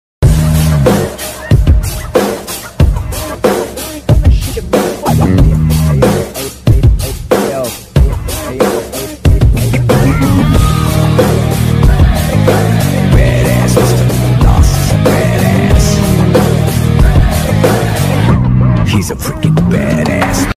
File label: bgm ringtone bass boosted; leo interval; vijay